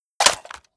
bar reload end; emplacement

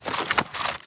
blasters deathray foley undeploy; holster

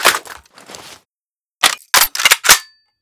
m2 reload, emplacement

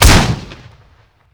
mortar fire; emplacement

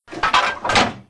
reload medium; emplacement

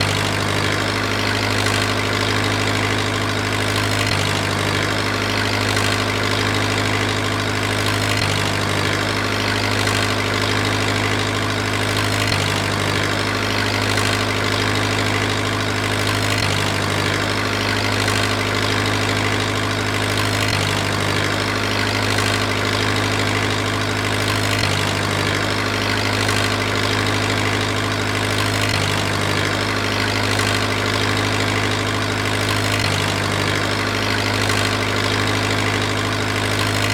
fourth cruise loop, forklift truck; garrysmod; sw forklift truck; sligwolf

